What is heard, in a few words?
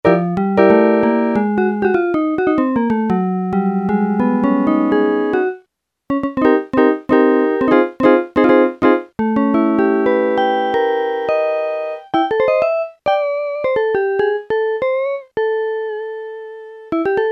JAZZ GUITAR, Yamaha SHS-10